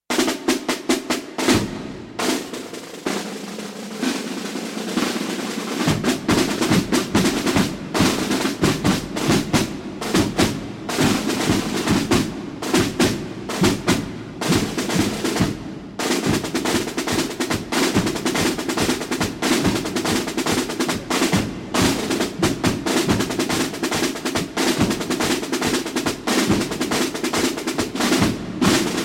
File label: Victory Swing; Jubilee